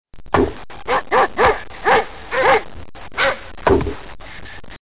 tame dog

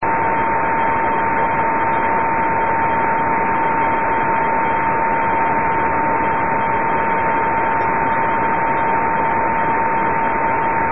unmodulated beacon 145MHz938; hamsat

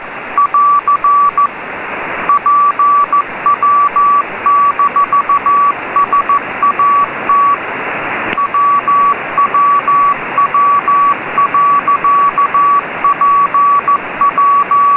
CW; pwsat

